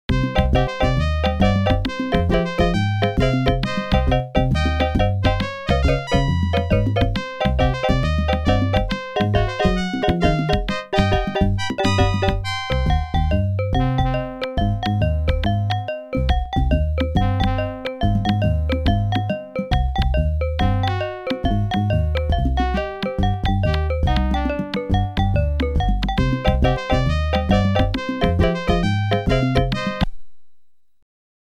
OPL2

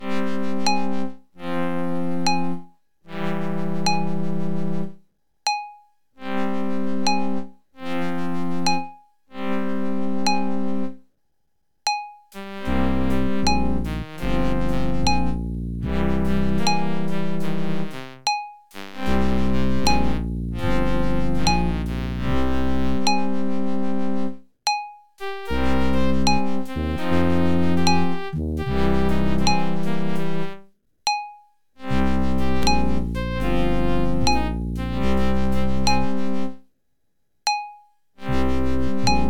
OPL3

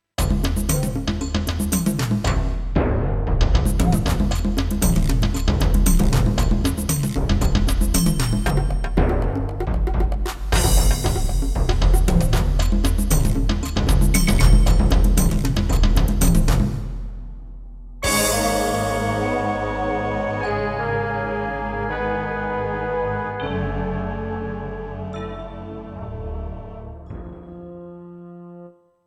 SC880; QFG3

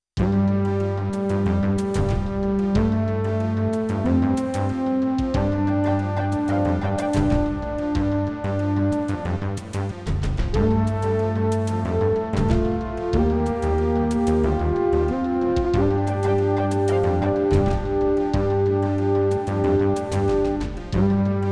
PCI

